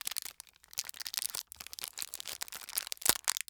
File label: Wrapper Candy Bar Unwrapping, Household